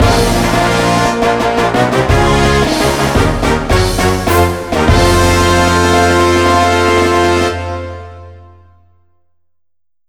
ファンファーレ; ガールズケイリンコレクション

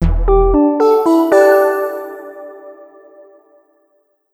レベルアップ; song of memories

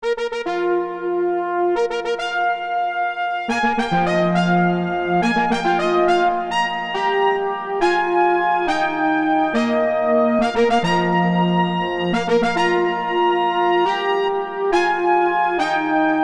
ジングル (MT-32); 38 Man Kilo no Kokuu

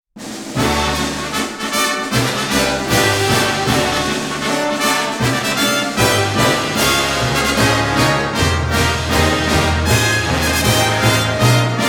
ファンファーレ; アオハル杯; umamusume pretty derby winning live 12 2023

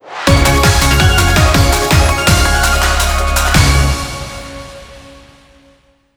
BONUS 終了ジングル

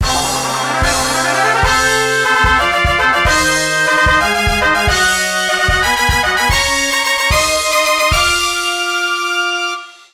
オープニングファンファーレ; River City Melee Mach; PS4

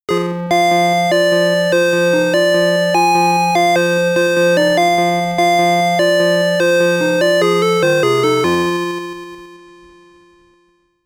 ボーナスゲーム BGM; ハーフパターンクリア; Nichibutsu Shooting Sound Box

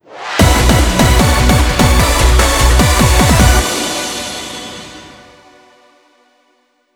次回予告 タイトル告知ジングル

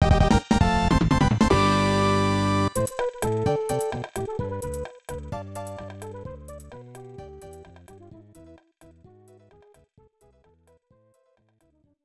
Shouri no Fanfare; Super Momotarou Dentetsu III; SNES

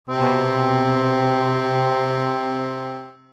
Kiteki (Whistle); World Cruise Story

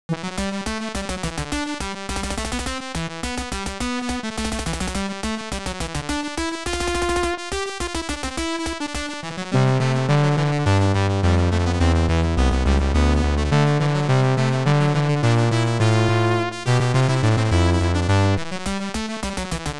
ファンファーレ 全国統一; Nobunaga's Ambition Tenshouki; PC-98

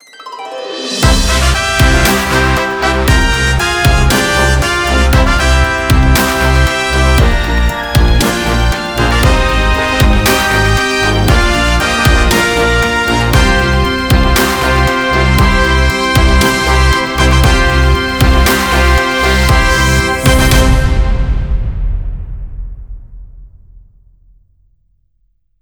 ファンファーレ：予選

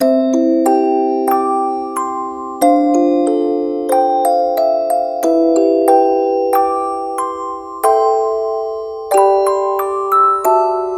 埃をかぶったオルゴール; Kujiragami no Tearstilla; World Election; Hatsujou Sprinkle

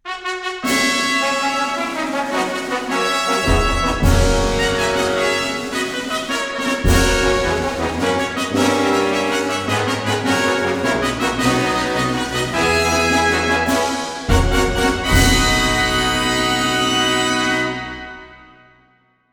ファンファーレ; 船橋レース場; umamusume pretty derby winning live 12 2023

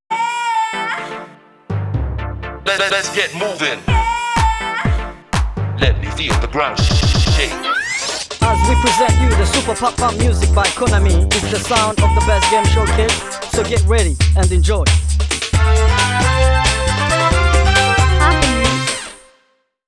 タイトルジングル; pop n music 4; arcade

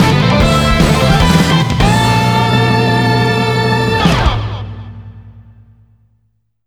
発展ジングル：予選レース

